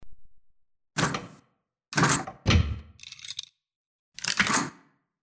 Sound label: m27 reload